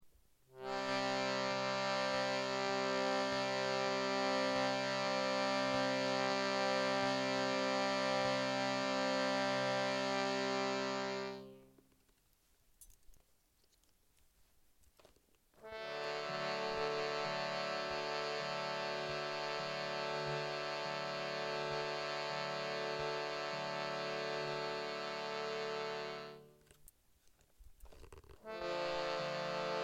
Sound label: shruti